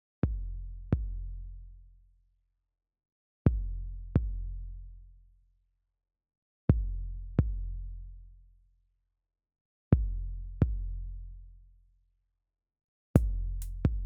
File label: Drums II & Perc; Risk of Rain 2